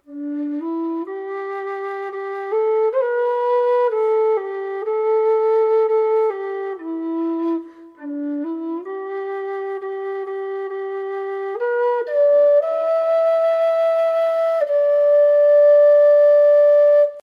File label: overton low d, tinwhistle